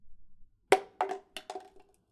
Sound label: Paper Cup Falling Paper Coffee Cup Falling Onto Hard Surface